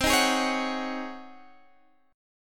C sharp Major 7th Suspended 2nd Suspended 4th, chord